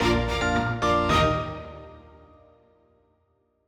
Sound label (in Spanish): medio sin drums